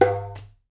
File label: DUMBEK, ETHNIC DRUMS; Spectrasonics; Liquid Grooves 1 & 2